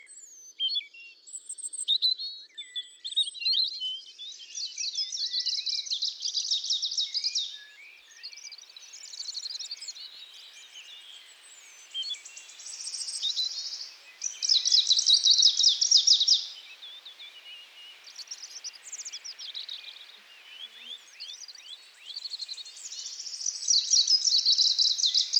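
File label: greenish warbler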